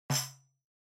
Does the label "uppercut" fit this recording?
No